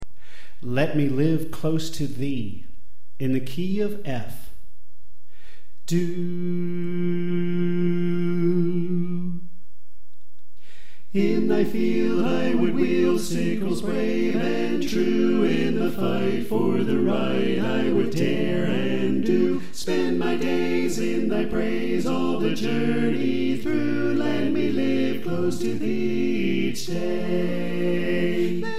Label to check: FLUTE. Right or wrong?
Wrong